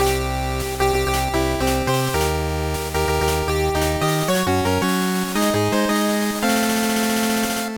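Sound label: alternate fanfare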